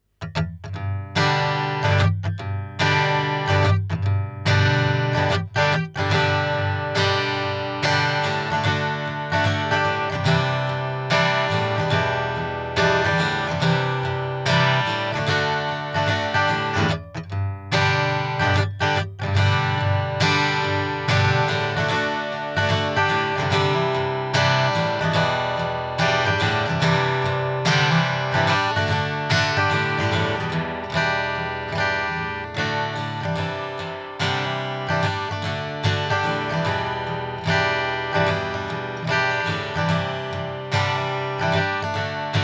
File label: gibson southern jumbo